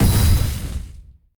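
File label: magic explosion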